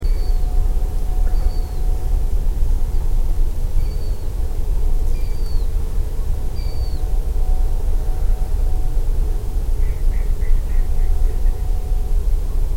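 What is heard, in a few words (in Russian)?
bolotnyy lun golos, marsh harrier voice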